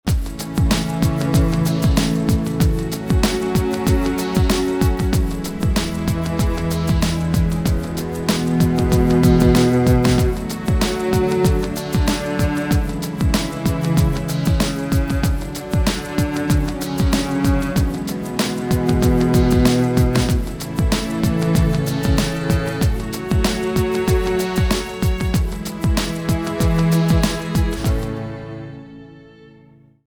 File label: Healing Strings